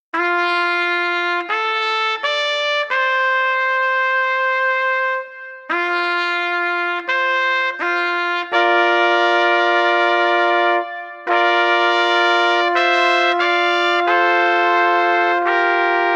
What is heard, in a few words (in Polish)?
hejnal z fortepianem